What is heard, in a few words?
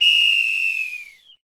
SPEED DOWN